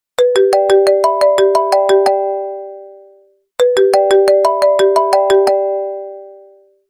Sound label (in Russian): dlja sms